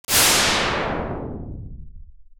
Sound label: Magic